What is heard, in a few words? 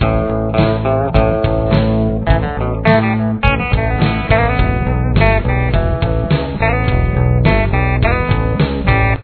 solo 2 main